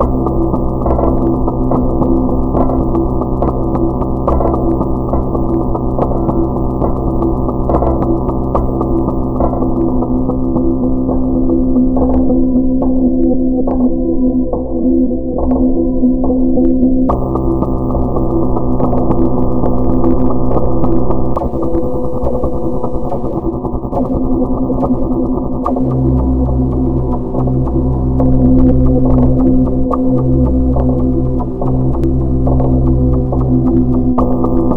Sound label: Techno; Mantis; puss soundtrack